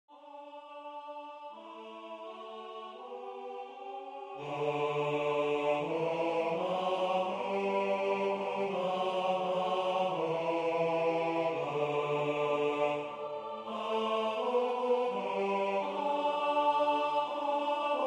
Non Nobis Domine TENOR; Choir Spring Summer 26

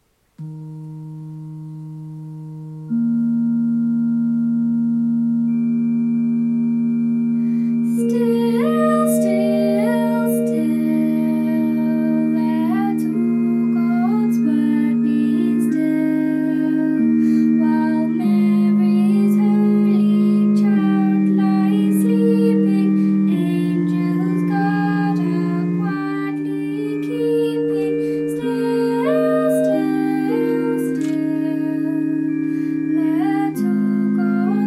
Still Still Still Soprano, Christmas Choirs

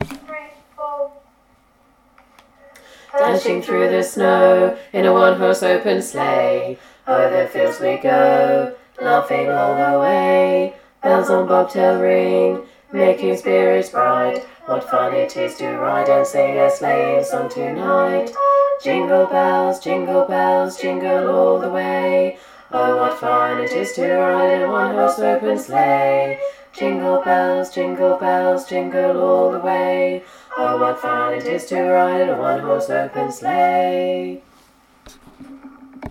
a cappella SATB; Jingle Bells; Choir Christmas 2023

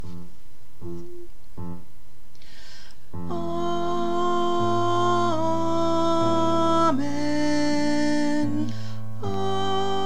Amen Soprano, Choir; Bridgewater; Choir Spring Summer 25

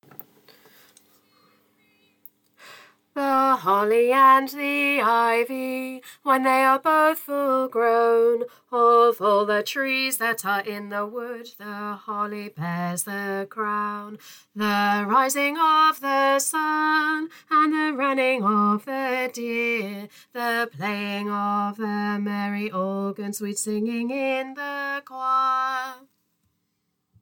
tenor, Choir Christmas; Choir Christmas 2023

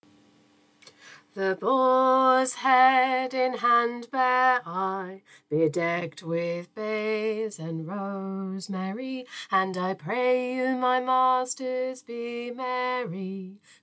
tenor, choir; Choir Christmas 2023

